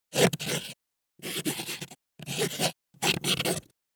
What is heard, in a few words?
Pen writing on canvas short and irregular